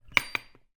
writing bureau metal drawer handle release hit drawer, household